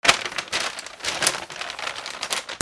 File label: PAPER